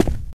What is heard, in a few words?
plaster, footsteps survivor walk; Left 4 Dead 2